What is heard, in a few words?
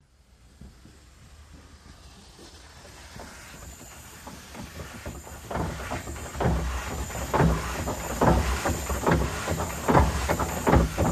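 Steam Train Motor Mechanical, steam train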